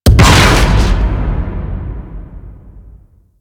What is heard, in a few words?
Scaffold Explosion; Warframe; Railjack